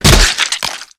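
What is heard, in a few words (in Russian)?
громкий короткий звук разбившейся банки с вареньем об пол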